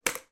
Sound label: crutch put down on floor; foley